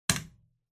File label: Light Switch Wide Panel, Wall Hard